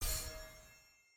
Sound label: dragon reward unlocked; tft